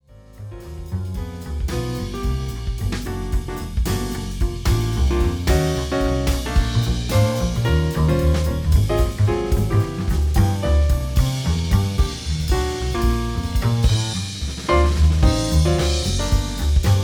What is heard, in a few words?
jazz trio